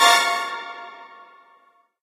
Stab; Friday the 13th: Killer Puzzle

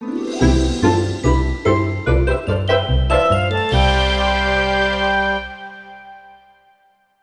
Bari Sax

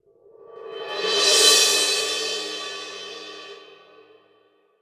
Cymbal; the legend of zelda skyward sword wii gamerip 2011

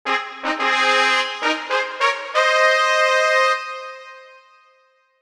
Player Fanfare; Pokemon Battle Revolution; wii

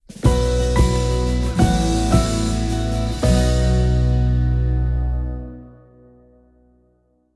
Battle Win; Tales of Crestoria; Android

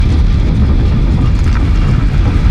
Ruins Boulder Roll Loop

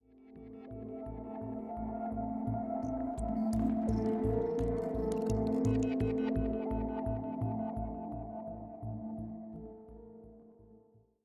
85bpm; Research High; Legends of Pegasus; windows gamerip 2012

